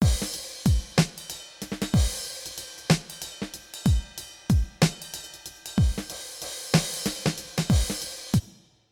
Beat

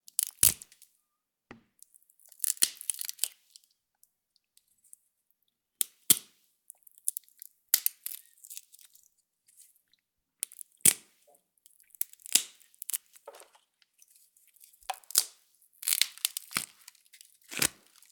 Breaking Sticks

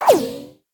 Buttlet Swish

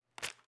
Snatching Dollar

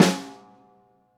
Snare High Vol

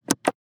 Press Button

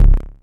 sid 6581 kick note c2

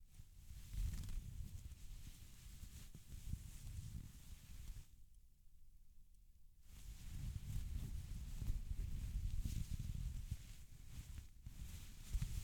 Friction

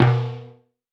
Tom high

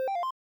Success

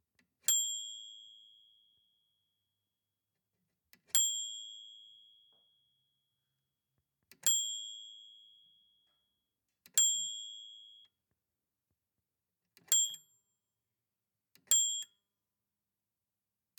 Typewriter Bell

